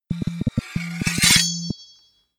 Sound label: Stereo Sword, notifications; Motorola; Edge 70 Max China